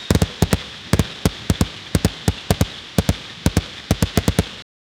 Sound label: military gear full equipp